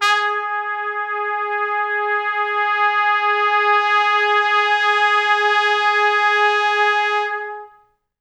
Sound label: G#3, Pop Brass; Best Service; ProSamples vol.19; AKAI